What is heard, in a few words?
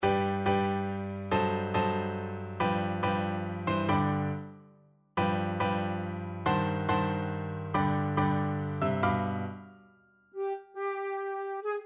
waltz flute